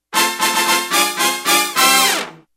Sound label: trumpet